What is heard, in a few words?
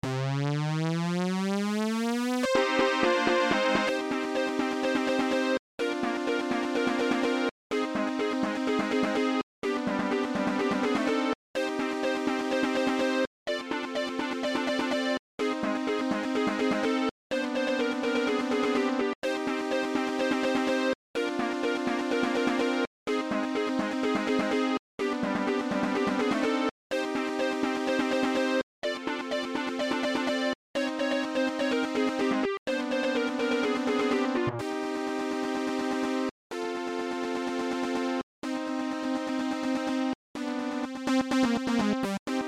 techno